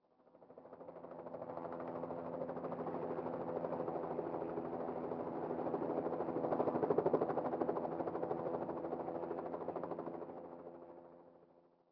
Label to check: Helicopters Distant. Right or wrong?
Right